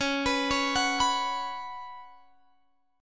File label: C sharp 7th Suspended 4th C sharp, Guitar Open G